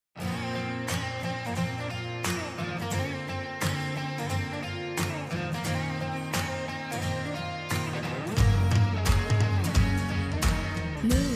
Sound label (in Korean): 쿨한 10대 소년 노래 엔딩; 조이드와일드